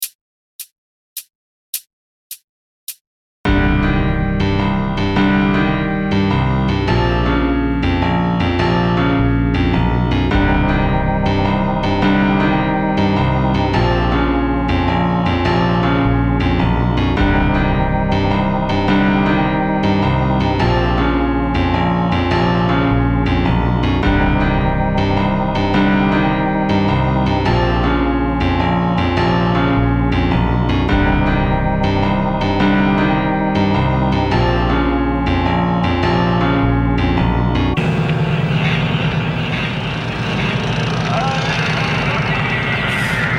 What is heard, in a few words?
Shuffle playalong